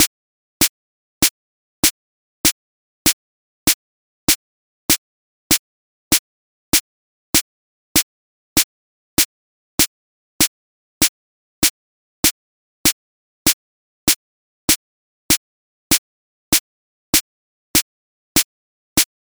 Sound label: delay snares cr78 body dry; aas; objeq delay